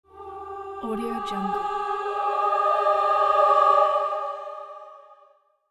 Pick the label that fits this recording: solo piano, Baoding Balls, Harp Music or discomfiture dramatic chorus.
discomfiture dramatic chorus